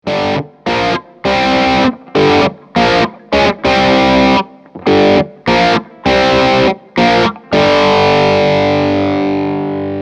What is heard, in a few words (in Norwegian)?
Gitar solo